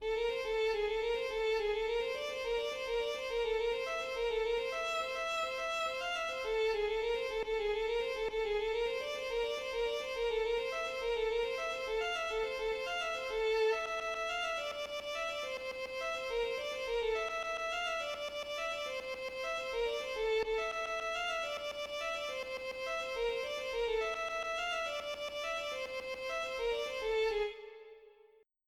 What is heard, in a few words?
Computing Loop